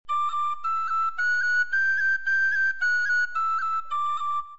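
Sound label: cuts, Tin-Whistle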